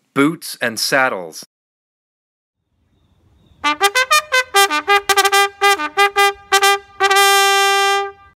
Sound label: Prepare to Mount aka Boots & Saddles, bugle; c1880 Cycling Bugle; henry keat & sons cycling bugle